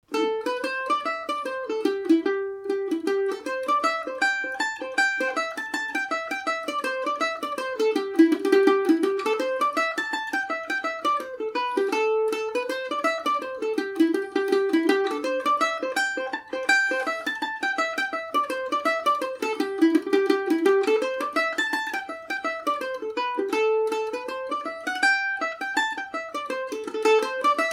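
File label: A dorian, Irish mandolin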